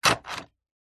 leisure rc car upside down front wheels turn action click